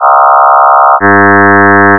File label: freq Mod